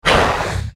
lesser wraith dying

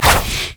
decoy swipe; LoL; monkeyking

